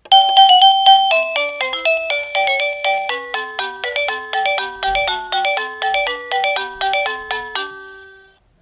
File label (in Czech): melodie